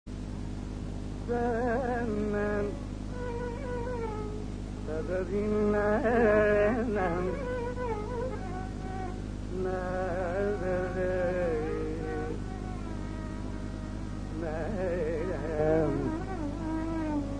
surutti alapana; manodharma

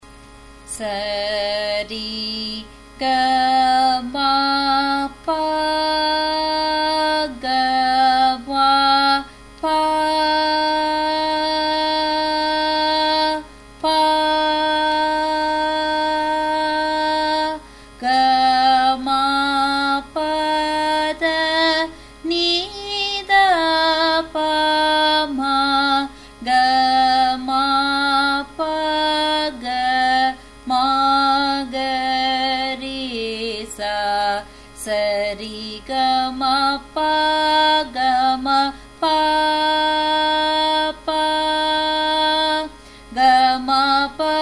Sarali Varise G sharp, music; Carnatic Music

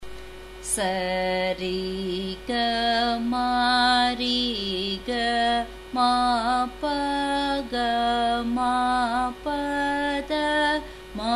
Eka Talam, F sharp

